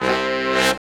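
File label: brass shorts, Brass Sections, Horns; R&R Sect; Roland LCDP06 Brass Sections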